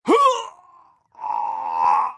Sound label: male pain death; firefight